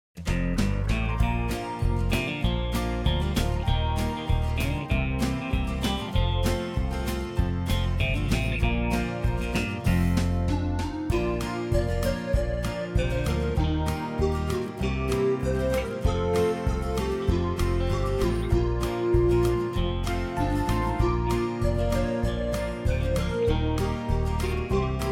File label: instrumental; Isnt He Wonderful